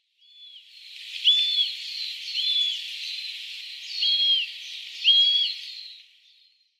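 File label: Ogasawara Buzzard, ogasawara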